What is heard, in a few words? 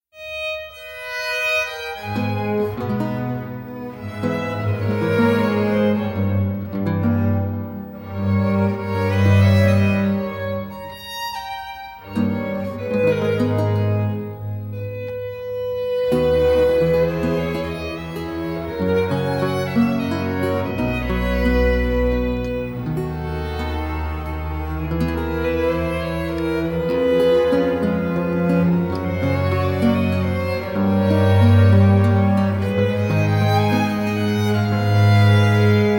Cello Concerto; Casanova